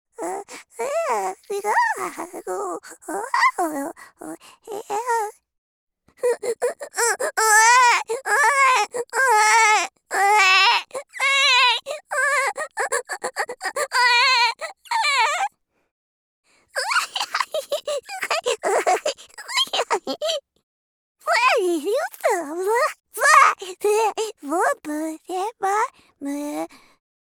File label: Baby